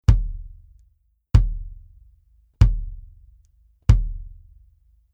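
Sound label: BASS Ctd Bury Beater, bass drum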